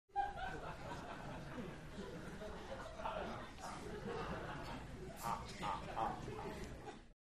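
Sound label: Crowd 100 Light Steady, medium int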